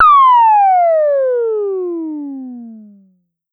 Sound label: Pollard Syndrum